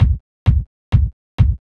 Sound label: 130 kick loop, 4 on the floor loop; Kicks